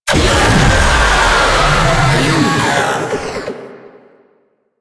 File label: Death, Vocals; Proto Infested Babau